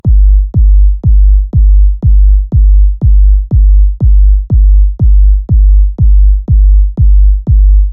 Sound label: kick; Shorten; Dropkick; preset Dark Matter; united plugins dropkick